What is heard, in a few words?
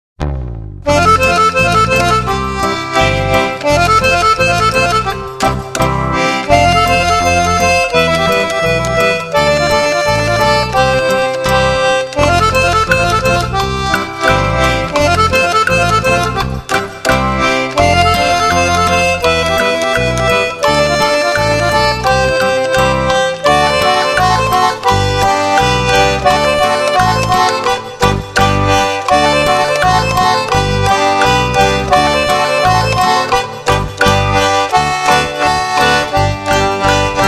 concertina